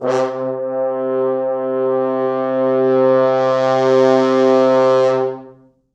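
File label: 4 French Horns, Horns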